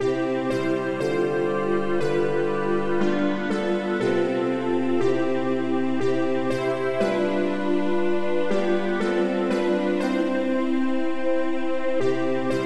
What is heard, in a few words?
polyinstru; Father we adore Thee; hymns